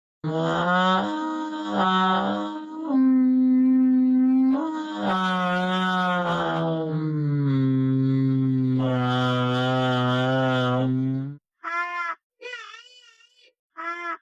asmr

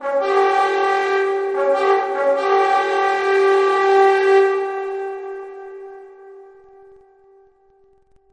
battle horn

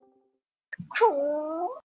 bark

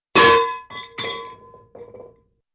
metal thing drops and rolls

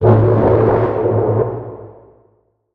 freighter alarm blare; no mans sky

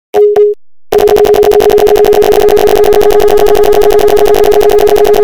drive sounds gun

